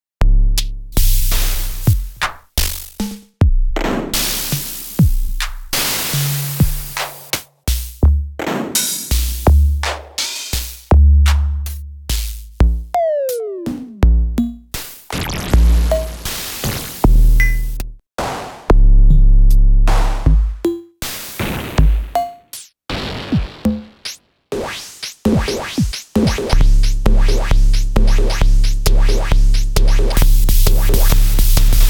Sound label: korg er 1